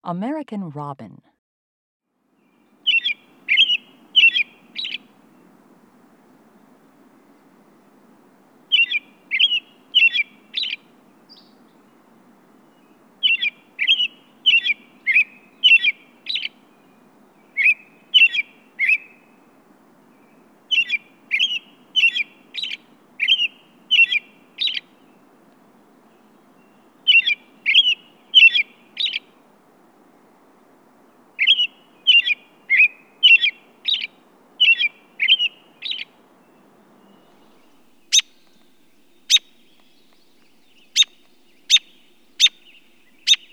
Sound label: American Robin